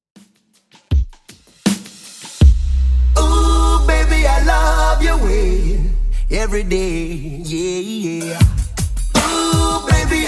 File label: 90s Reggae Redrum 80 bpm; remixplanet